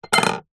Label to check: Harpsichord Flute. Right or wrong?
Wrong